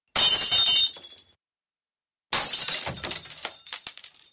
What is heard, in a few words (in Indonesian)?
Kaca Pecah